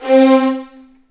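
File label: C4 violins; The Ultimate String Collection; East West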